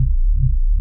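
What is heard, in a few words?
Ambience Sci-fi Energy Loop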